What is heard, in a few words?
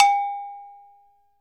TOTOSAN HIGH, Percussion; ILIO; Synclavier Percussion 2; World & Orchestral